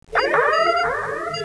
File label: Shuttle Bay Door Alarm; Star Trek; TNG